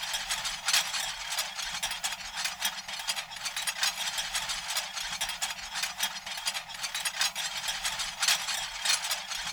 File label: L-39 AI25 ratchets, aircraft; DCS